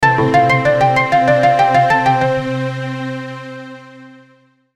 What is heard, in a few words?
Logo Koto Synth Strings; Sonic Logo